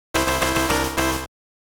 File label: Act Start; the cowabunga collection